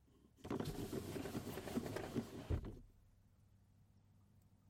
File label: opening a car window mannually